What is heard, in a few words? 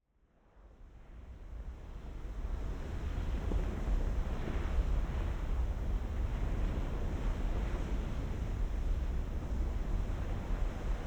Volcano medium activity magma erupting continuously degassing and rock falling, Ethiopia; Charlie Atanasyan